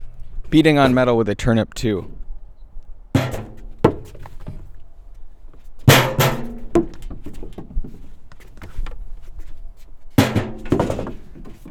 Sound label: Metal Hopper Turnip, PUMPKIN HARVEST FOLEY